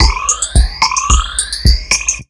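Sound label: 110 filter, Ragga & Dub Reggae; USB Soundscan vol.27; AKAI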